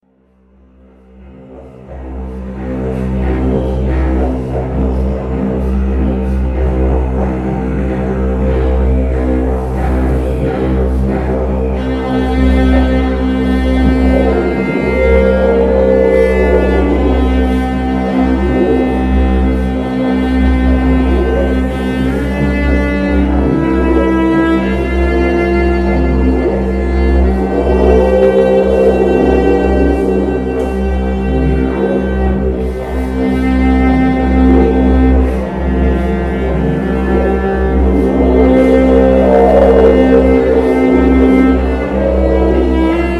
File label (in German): Didges + Cello